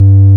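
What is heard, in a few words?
organ Vox Jaguar, Continental; Roland LCDP09 Keys of the 60s and 70s 1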